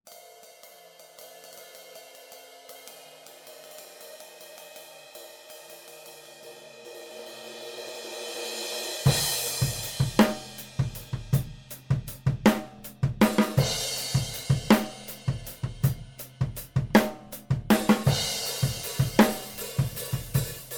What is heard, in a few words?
Drum Overheads SCX1